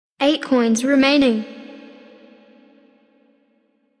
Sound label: coins; unicorn